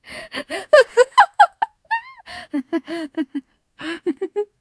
beautiful female sweet, female

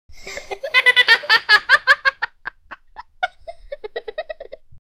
soft family laughter chil

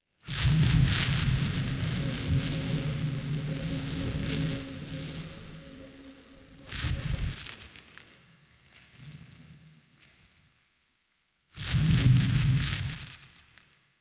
thor like lightning sound; mythical

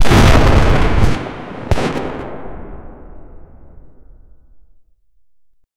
gunfire and explosions

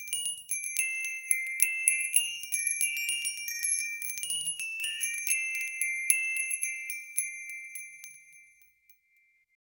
soft cheerful christmas j

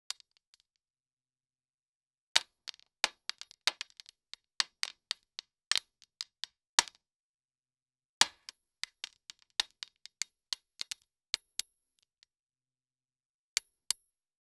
bullet shell from gun

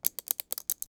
satisfying sound of piece, lego

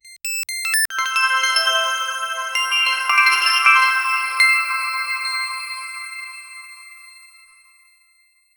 outro jingle

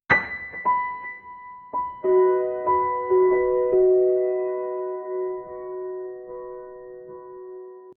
piano slows last note

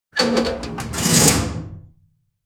metal door slide; hardware

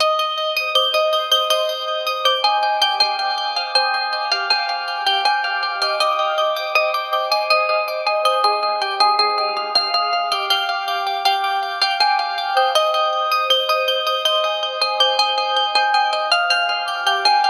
trap

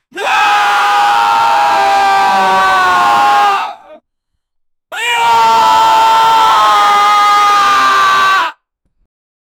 murlock screams with ange

